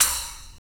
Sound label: splash, pearl master studio; drums